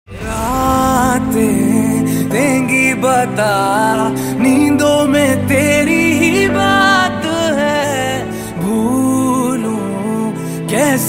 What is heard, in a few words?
Hindi Sad Ringtone; Kabir Singh